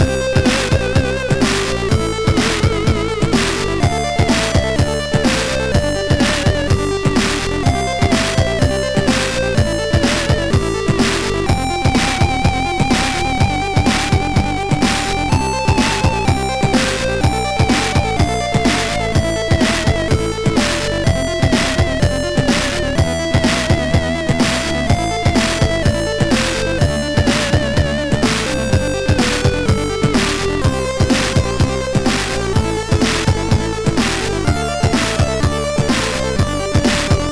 Game Musix sid MOS6581R3

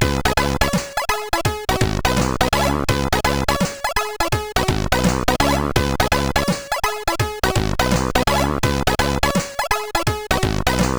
Jazztune, CSG8580R5

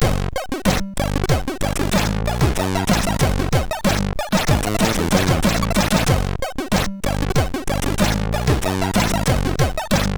sid MOS6581R3

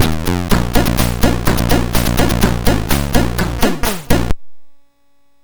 sid MOS6581R4; Radax

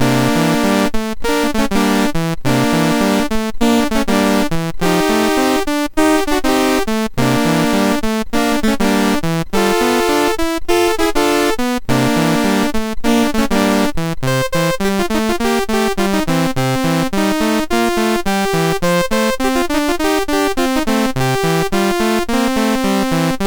BASIC, sid MOS6581R3; Latimer Joey

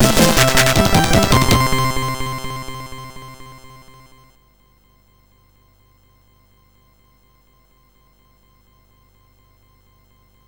MOS6581R4, sid

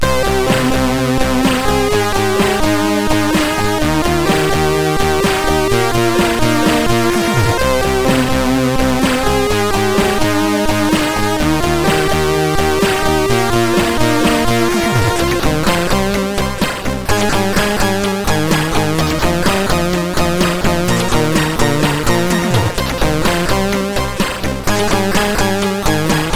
MOS6581R4, sid; Pride

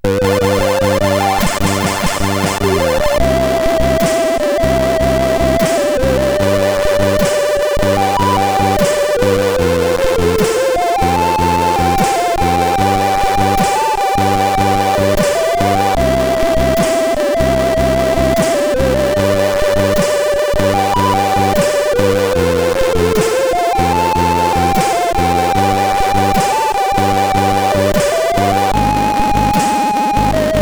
Music, CSG8580R5

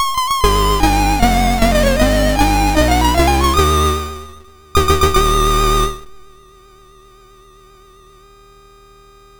sid MOS6581R2; Jackal NTSC

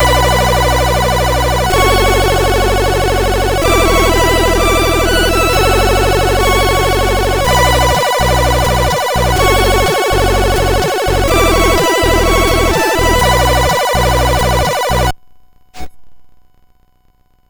sid CSG8580R5; Rockin Limited; Schaefers Joerg; Duotris

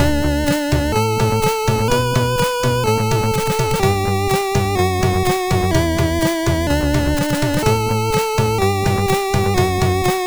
Twinkle Twinkle sid MOS6581R2

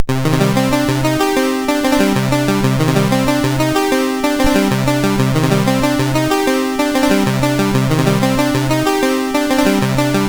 Crack Intro, sid MOS6581R2; Dunex

